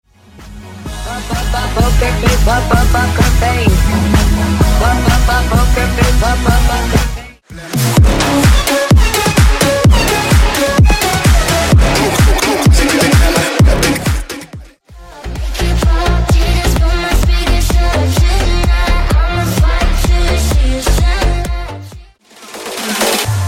Funk Live MashUp Megamix 128Bpm

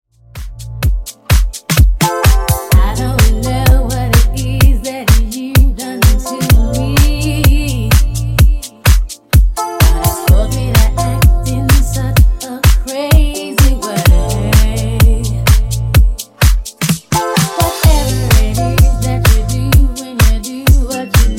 Funk Bootleg Remix 127Bpm

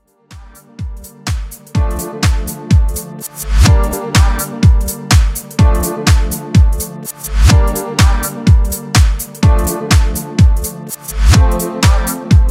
Club Extended 125Bpm Clean

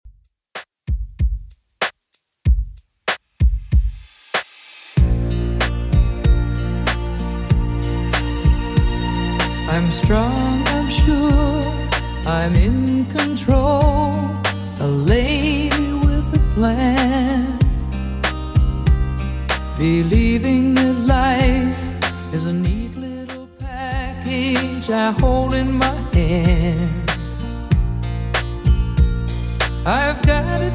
70s Redrum 95bpm

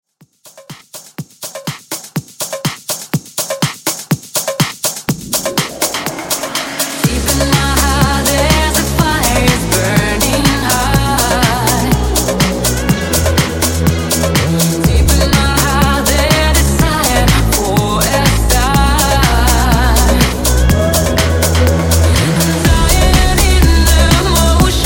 Club House 123 Bpm Clean

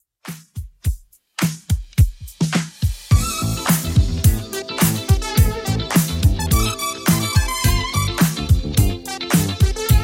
80s Redrum 106bpm

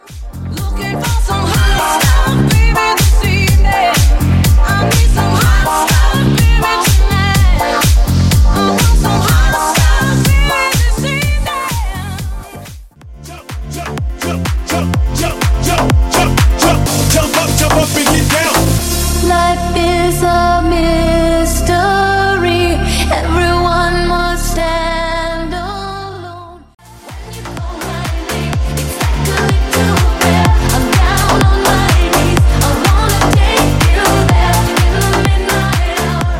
Old School Classic House Megamix 124 128

